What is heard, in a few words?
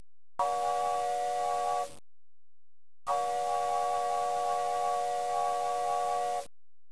train